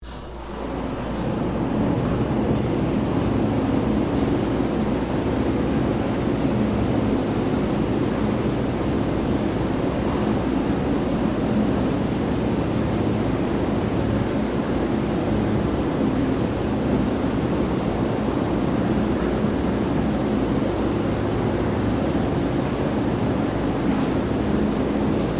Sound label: Whirlpool Run, On, Off, Locker, Room; Sports; Miscellaneous Sport Sound Effects; BlastWave